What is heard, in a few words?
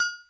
lo agogo, Latin Percussion; Western and Latin Percussion; single hits